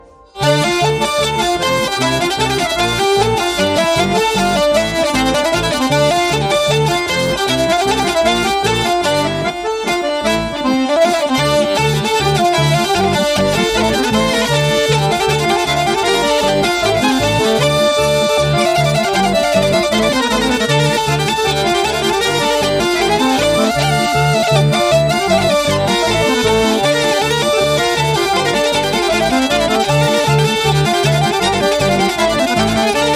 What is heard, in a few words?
Polka Ska